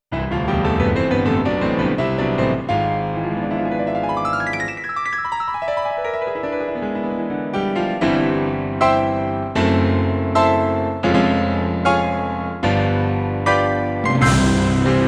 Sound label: Korg 01R-W; Factory Songs; WKRG Radio